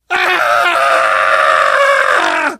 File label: death scream, survivor; voice; source engine; left 4 dead 2; namvet